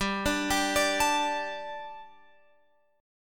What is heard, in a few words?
G Suspended 2nd G, down, Guitar, Open F; Gsus2; arpeggiated